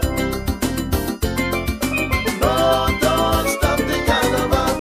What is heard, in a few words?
Pan Ditty, steel drum